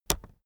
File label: keyboard external space push heavy